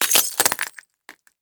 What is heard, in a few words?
glass crunch; Doom3; Trite Breedind Facility; kiltron1